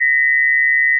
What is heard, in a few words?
2000 Hz plus 1860 Hz 140 beats per second; (48% of Critical Bandwidth)